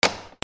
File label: DOORS